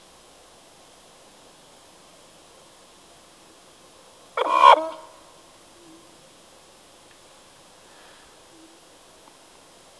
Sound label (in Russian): Лай собак, звук коровы, звук барана, и др. звуки животных